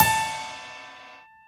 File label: soft hit whistle; osu!